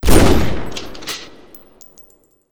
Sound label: shotgun; Zomboid 41.78.16; Project Zomboid